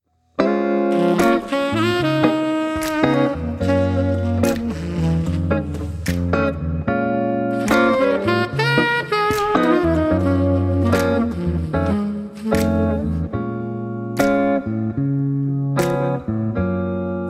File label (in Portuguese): Blues da canela ACOMPA